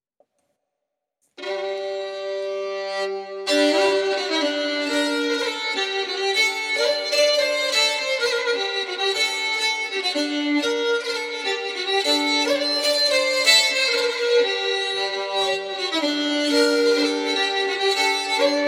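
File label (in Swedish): Polska, säckpipa; Glädjens blomster; Wiktor Gustavsson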